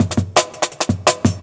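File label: Jungle Breaks, 168 bpm; Original Jungle Breaks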